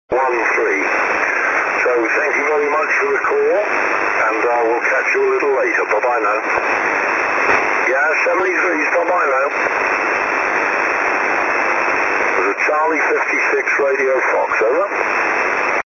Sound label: 6m ssb